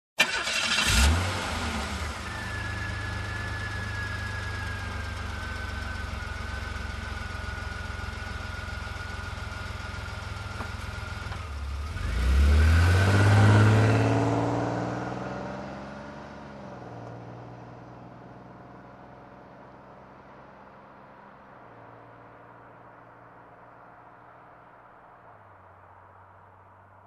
Car engine ignition and drive away

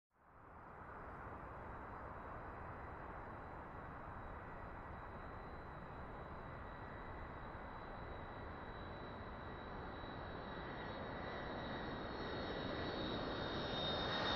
Aircraft Approaching Whirring Sound

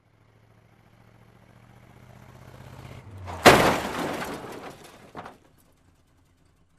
Approaching Car Crash

